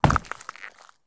landing glass; Escape from Tarkov

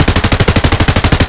aps outdoor close loop; Escape from Tarkov

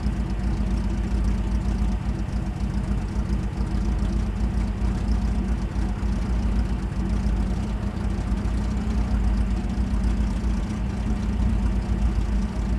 m4a3 engine rpm; War Thunder

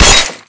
bullet glass, weapons impact; Call of Duty Modern Warfare

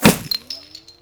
plaster bullet impact; Rust

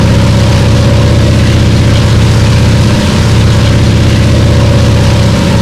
an2 rear, engines; War Thunder

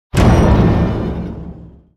damage hits explosions; World Of Warships

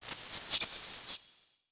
bat; World of Tanks Blitz; hangar halloween 2019

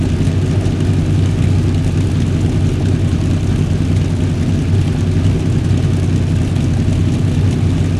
maus engine rpm 33 load, engines tanks; War Thunder

